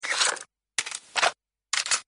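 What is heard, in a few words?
M4 reload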